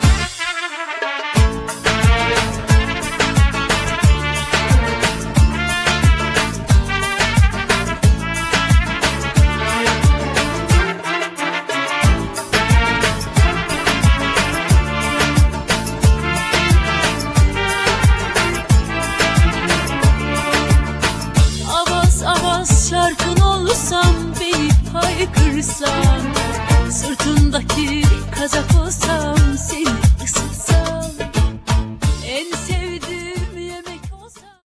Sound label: turkish